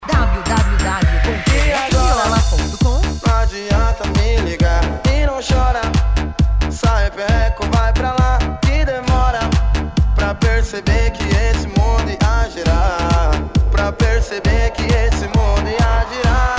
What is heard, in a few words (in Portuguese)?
eletro e viola especial de eletro funk